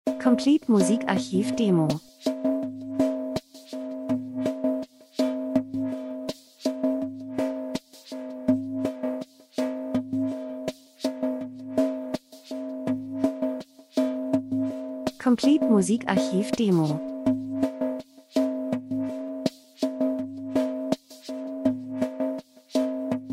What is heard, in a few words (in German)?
drums, rhythmus; Variation Percussion monoton gleichförmig schwermütig eindimensional langweilig Reise Dokumentation Natur